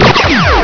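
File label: blaster; Duke Nukem 3D; Duke Nukem 3D Screensaver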